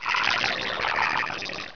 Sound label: alert, headcrab poison; hl2